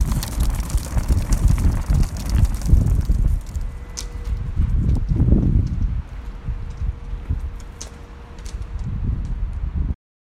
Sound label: protective ribbon